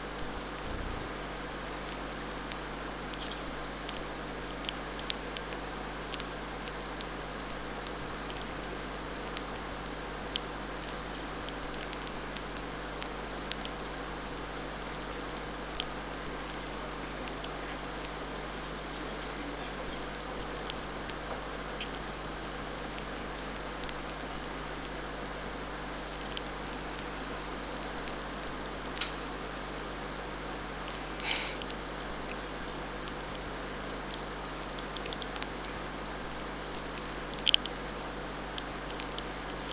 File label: ice bubbles; ORIGINAL